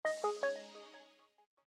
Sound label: Power Off